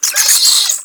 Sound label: Infested Minion Pain; Warframe